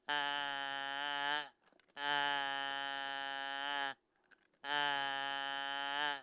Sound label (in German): kl quiekser